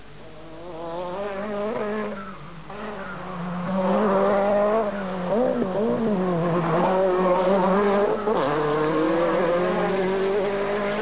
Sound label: Renault 5 Maxi Turbo